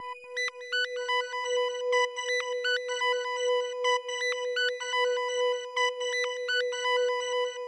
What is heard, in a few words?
pad